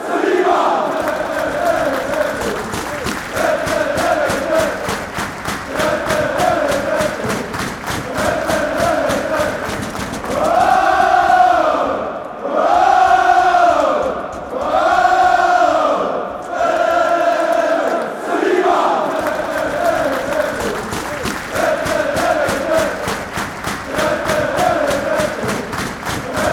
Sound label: chant